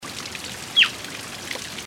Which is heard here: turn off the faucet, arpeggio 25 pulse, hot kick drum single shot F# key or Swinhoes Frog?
Swinhoes Frog